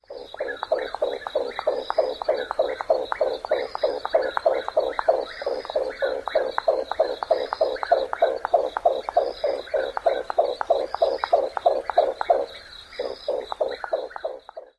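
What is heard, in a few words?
Chuck-will's-widow aug-aug-aug calls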